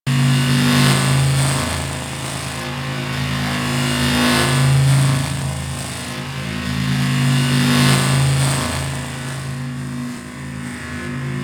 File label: Rail Jack Ambient Machine Loop; Warframe